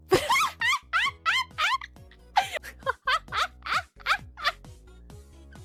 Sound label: taunt haha ha hahaha; phx new taunts pack; props taunts new